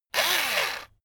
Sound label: electric drill screw out short; soundpool